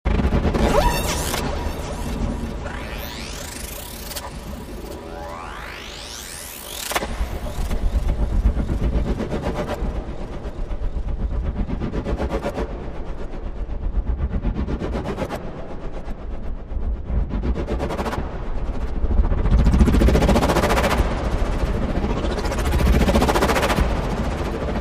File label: Gamma Ray abrasive, rhythmic stuttering; granular